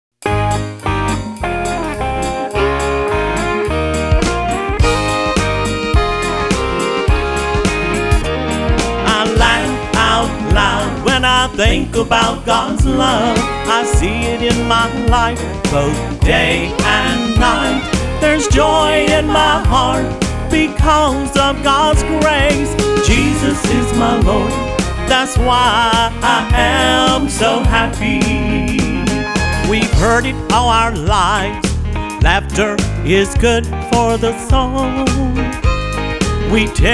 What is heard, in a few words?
Laugh Out Loud; Southern Style